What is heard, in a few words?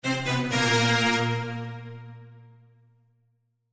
Strings Stabs Descending Danger; Musical; Blastwave